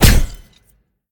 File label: projectile hit; smite; tiamat